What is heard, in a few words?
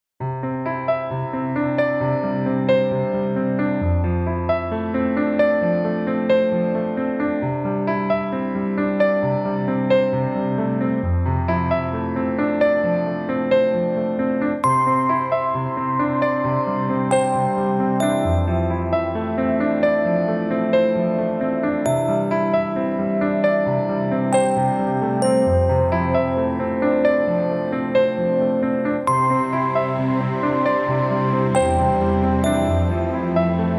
Atmosphere